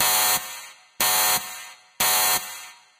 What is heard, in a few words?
alarm; Secret Agent